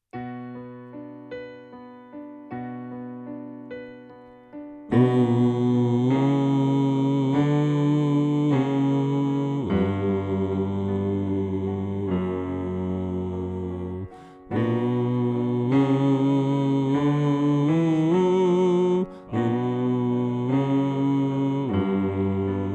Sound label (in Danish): Bas; oevefiler